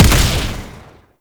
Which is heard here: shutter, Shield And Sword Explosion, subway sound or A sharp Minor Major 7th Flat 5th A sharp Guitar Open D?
Shield And Sword Explosion